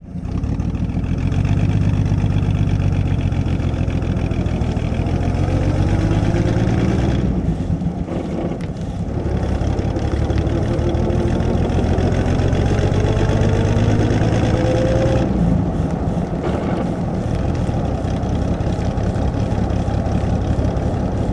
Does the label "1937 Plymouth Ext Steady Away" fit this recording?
Yes